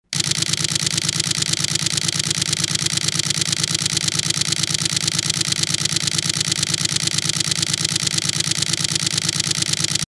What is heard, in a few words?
9fps burst, nikon d3s